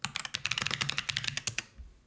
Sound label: clack short quiet